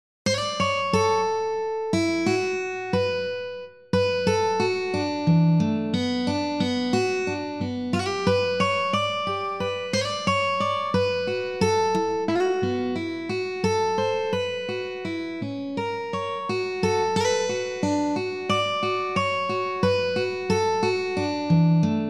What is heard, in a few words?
guitar; suikoden